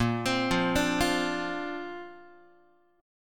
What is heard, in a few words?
Bb Major Add 9th, Bb, down, Guitar; arpeggiated; JGuitar